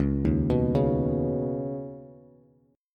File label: Db 7th Suspended 2nd, Bass, Db7sus2 chord